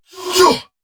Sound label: Attack Effort